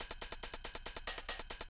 beat - hats, grime, 140bpm; Zero-G Sound Sense Grime Scene; Construction Kits; Its Primetime

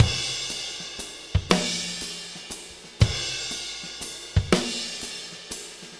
6/8 Loop, Drum Tools, Laid Back; Sonic Foundry (Sony Creative Software); Loops & Samples Drum Tools

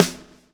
lash, backbeat

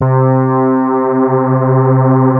FAT LEAD, Powered DX; Wizoo

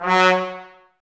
Woodwind Brass Ensemble; Miroslav Vitous

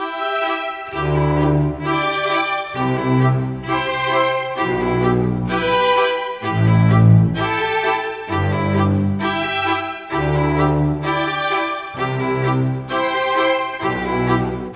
Cinematic, Orchestral Series; Sonic Foundry (Sony Creative Software); Orchestral Series VOL-3; Cinematic 14

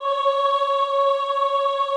AHH C4, choir; Best Service; Extended Classical Choir; AHH MIX

